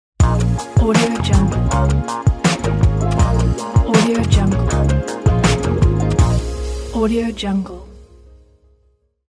sexy logo

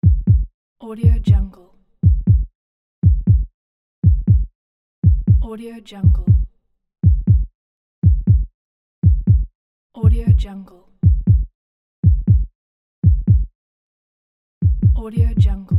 heartbeat loop with 3 speeds; aetherfx

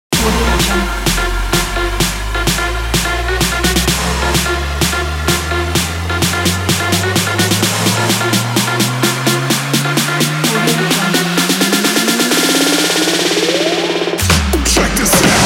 edm pack

